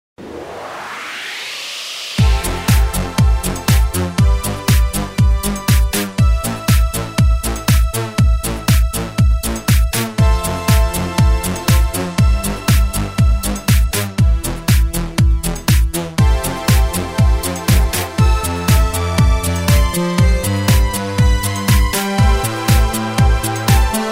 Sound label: monobass